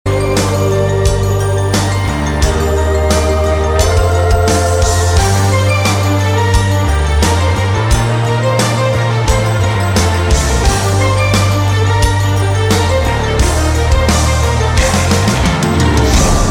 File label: hard symphony